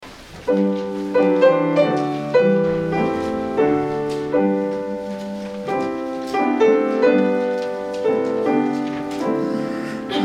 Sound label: Hymn; O God of mystery and might